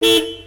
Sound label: horn car